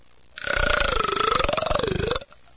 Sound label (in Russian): toshnota